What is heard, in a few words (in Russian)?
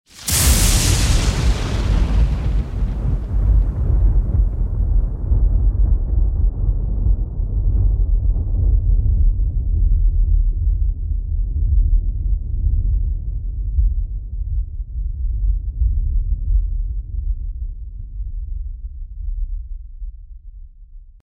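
удар молнии и раскаты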